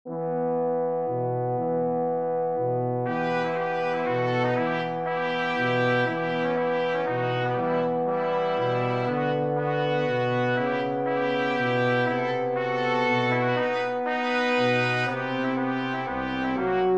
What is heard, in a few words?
folk song for brass quartet; grieg edvard; from lyric pieces